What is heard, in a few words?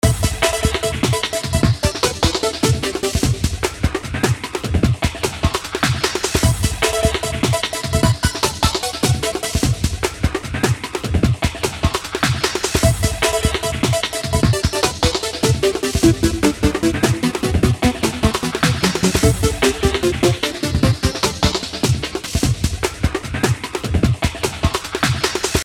Beat Synth Lead Fast Drums, Beat Arpeggio Piano Organ Chords Organic Drums Loop; Miscellaneous Loops